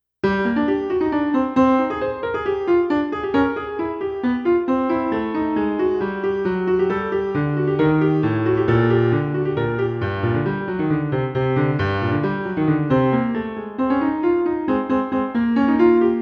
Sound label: intermezzo piano